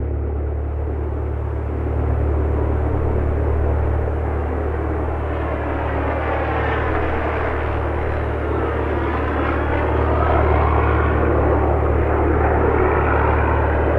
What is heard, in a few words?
warfare ambient planes low; War Thunder